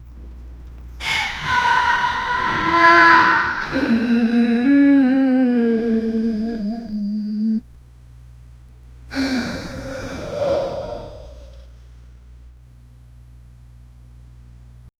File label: agonized monster moans, breathy